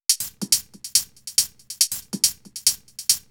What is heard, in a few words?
Hh Loop, Drum Loops 140bpm; Zero-G Trance Inducer